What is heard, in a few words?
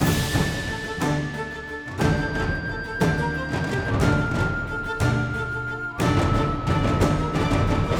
Outro; Mystic Vale